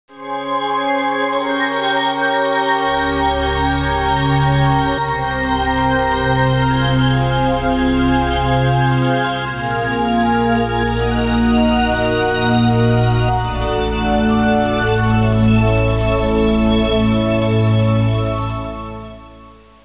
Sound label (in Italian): musica newage